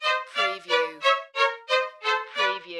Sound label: Music Strings Descend